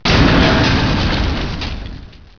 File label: damage